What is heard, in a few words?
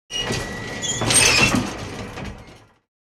Industrial elevator open door